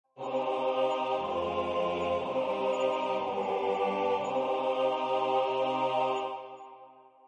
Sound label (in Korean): 주3화음 전체